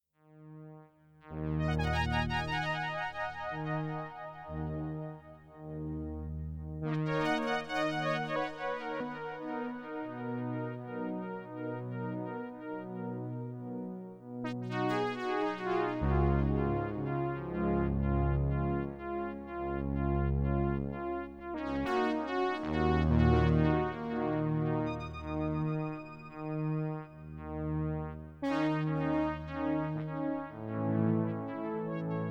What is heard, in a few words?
Sequential Prophet T8 Osc B Mod